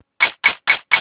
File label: camera burst; Sony Ericsson S700i